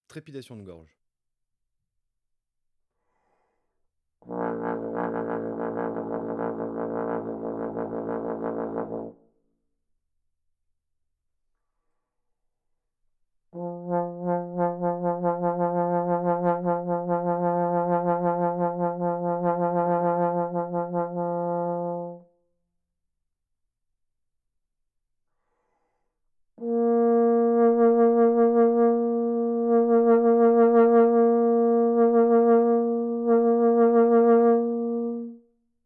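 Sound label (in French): trépidation de gorge, trombone; Effets; Ondulations vibrato; traite orchestration; trombone tenor trombone basse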